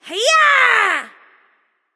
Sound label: battle cry; smite